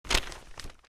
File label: page turn; Business skieme Beta 1